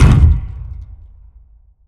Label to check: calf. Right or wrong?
Wrong